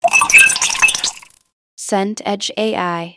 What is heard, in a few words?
pour water glass